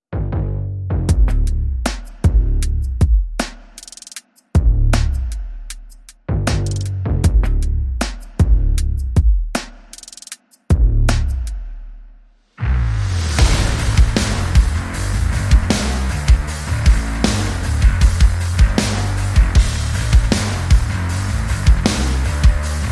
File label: Drums Bass and SFX Only